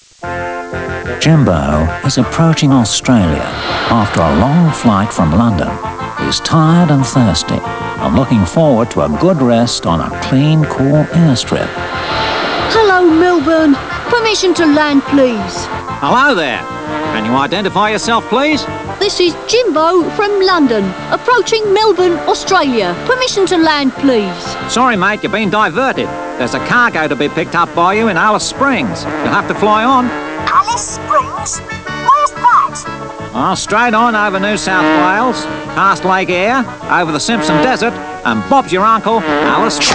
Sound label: whining; classictv; jimbo